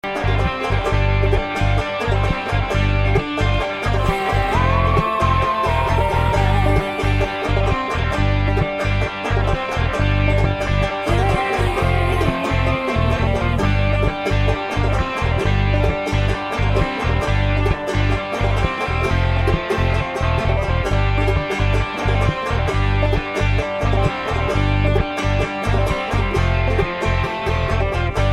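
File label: country melody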